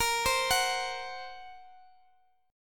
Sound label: A sharp Suspended 2nd Sharp 5th